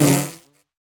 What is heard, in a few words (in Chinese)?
蜜蜂受伤声